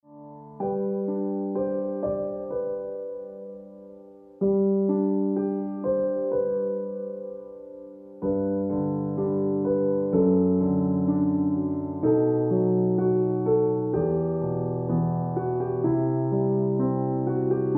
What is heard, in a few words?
Piano Music